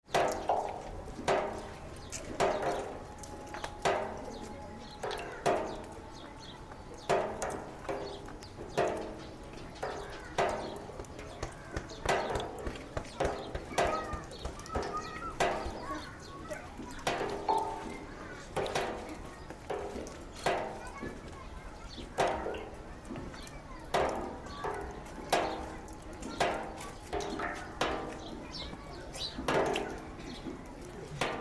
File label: Oil drum collecting rainwater Nepal